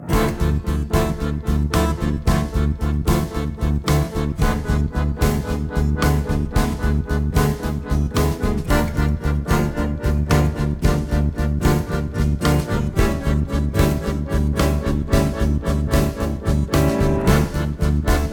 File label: In Game Boss; king oddball ps vita gamerip 2014